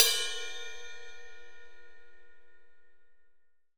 JAZZ RIDE; AKAI S-Series CD-ROM Sound Library VOL-3

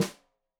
Snare Stereo, Drums; Deeply Sampled Drums; SMDrum

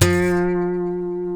G, funk guitars

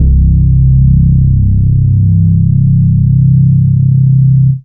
Single Electric Basses, Dance Floor Bass

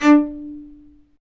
STR, Vc Marcato; String Master Series; Combos 1

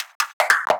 Beatbox Fills 150, Hybrid Beatbox Drums; Antidote Advent

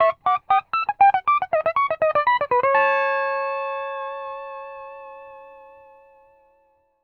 PD LOOPS 120, Whole Lotta Country

